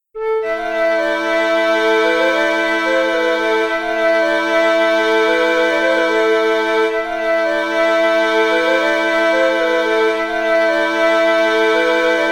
France, Auvergne

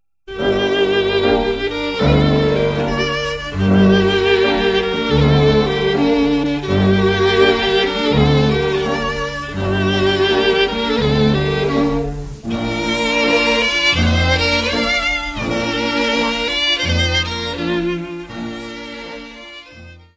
Ciuleandra - Roumanie

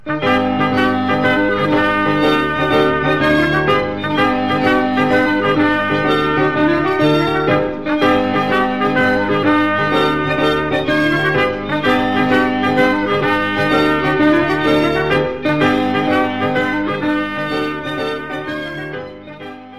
Allemande, Belgique

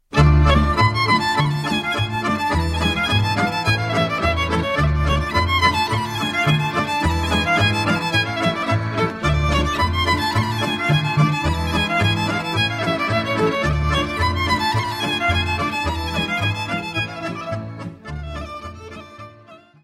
Kolo, Serbie

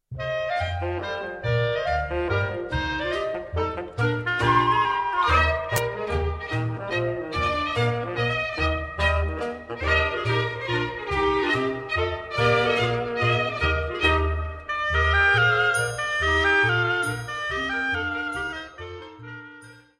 Nigare Polska, Danemark

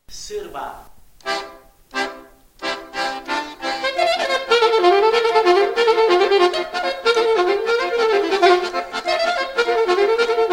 Sirba - Roumanie

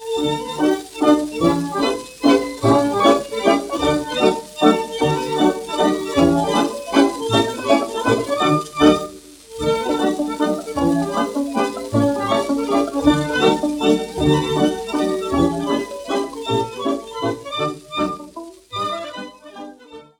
Hambo, Suede

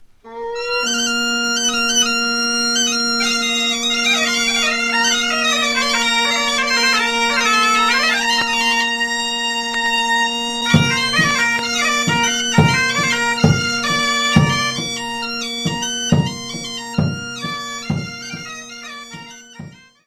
Laride de Carnac, France, Bretagne

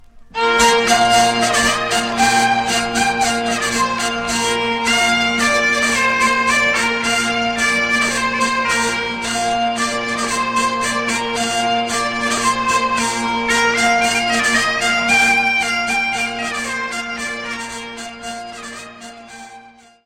Polka Piquee; France; Ploeuc

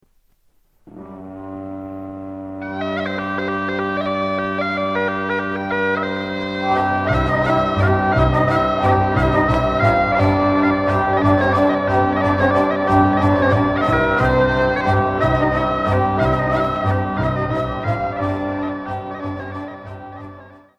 Varsovienne, Belgique

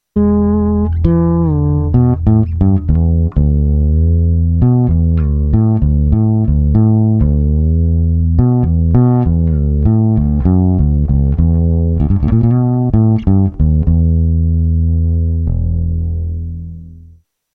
guitare basse sans frette; instruments

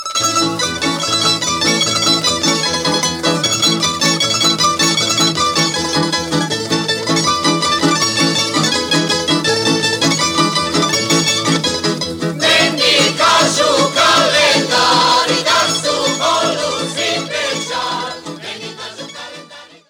Kolo, Croatie

